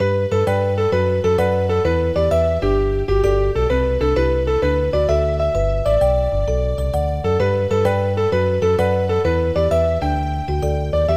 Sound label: bgm tower